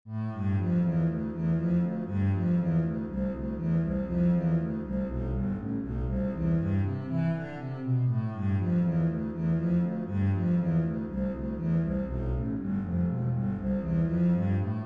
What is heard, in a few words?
bass etude